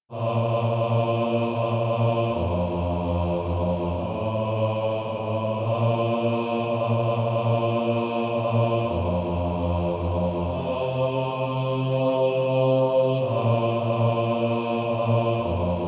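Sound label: Male Choir, bass 2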